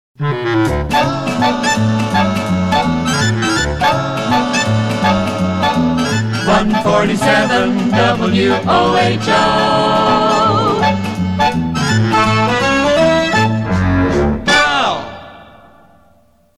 Trombone Sig and Theme; PAMS

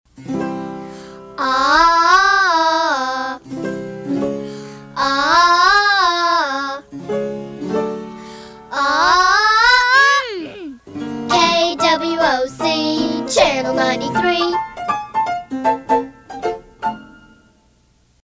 Vocal Excercise; KWOC

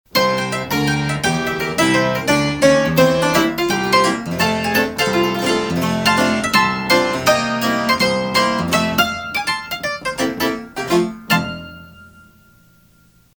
Rinky Tink Piano Theme and Signature; PAMS; KLIF

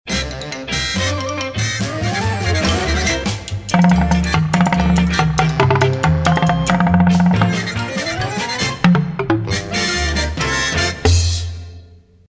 Boo Wacky; WIST

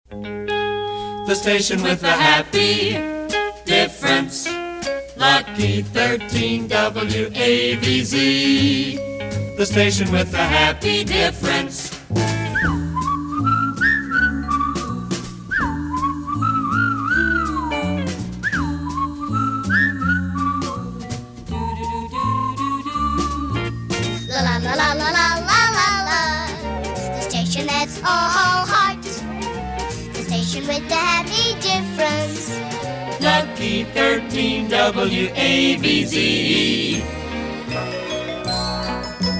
Happy Diff Promo; WAVZ

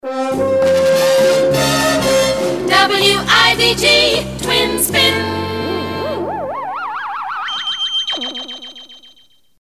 Spin; WIBG; PAMS; Wtin Spin

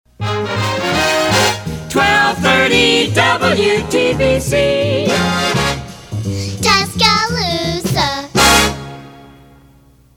F.C.C. Foxtrot; WTBC; PAMS

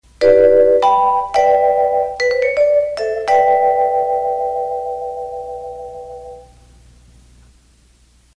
Guitar Sig, instrumental with signature; Guitar Sig #2; WMPS

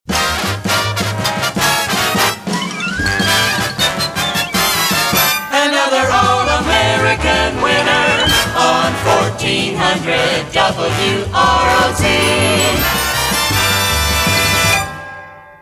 Winner; WROZ; PAMS

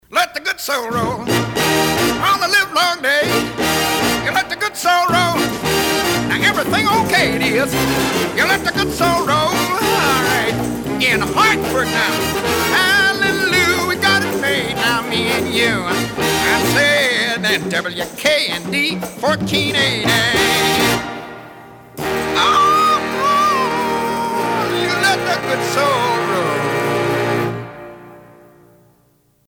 Good Sounds; WKND; PAMS

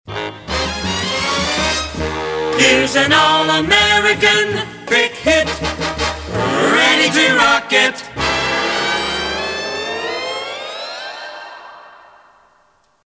Pick Hit; WKLO

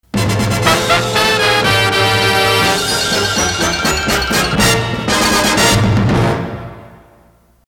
News Instrumental; KORL; PAMS; (1/2 of Sig)

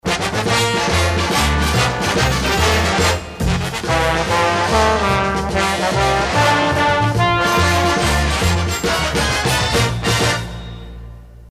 Instrumental; PAMS; WBBQ

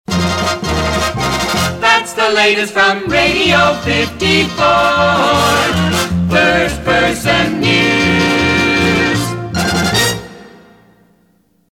News Outro; KNOE

